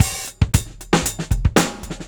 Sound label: Beat Loops; Zero-G; Monster Beats